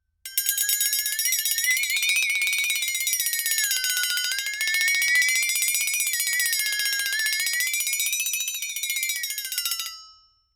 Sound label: crazy dinner bell